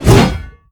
whoosh metal

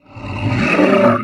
vox attack

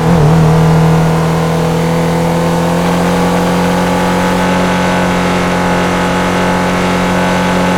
simulated vehicles second

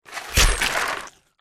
spike strip impact; gow; fortifications

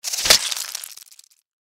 death sfx; npc; cazadore

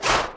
glaive swing

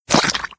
death splat; doom3

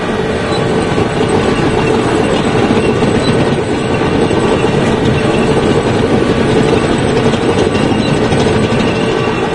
walk no vo; doom3; sabaoth

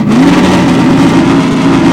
jalopy rev down

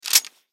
sniper rifle reload

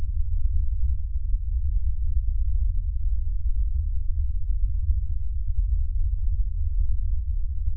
ambience, dungeon; soundscapes

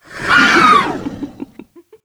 attack

